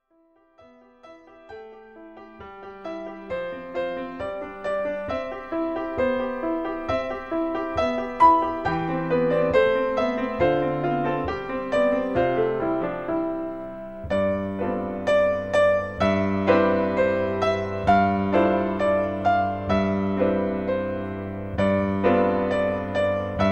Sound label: french childrens song; allegro